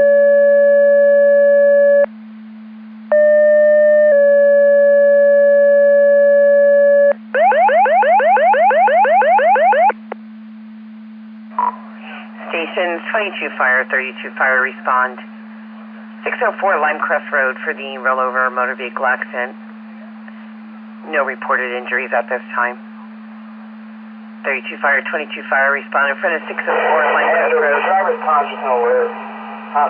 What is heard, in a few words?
Siren; Andover Twp Boro